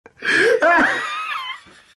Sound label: vipe laugh; dota; viper